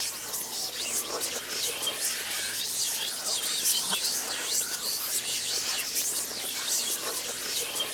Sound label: WHISP REV, whispers